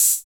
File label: Hhat, Hihat; Equipped Music; Loungin House